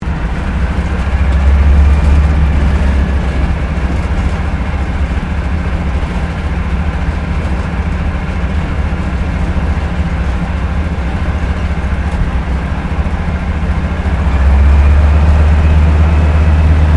AIRCRAFT AT-6D HARVARD MK III TAXI SHUTDOWN INTERIOR; Temora Harvard MK III; Airplanes; Vehicles; Airplane Sound Effects